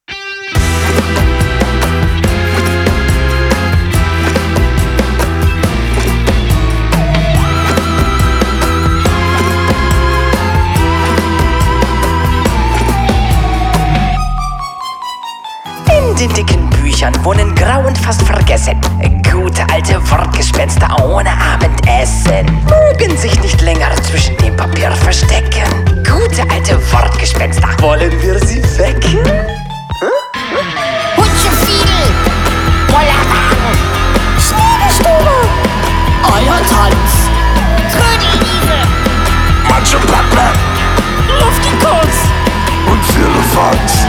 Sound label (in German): Lied; Grimm und Moehrchen Adventskalenderbuch; Wortgespenster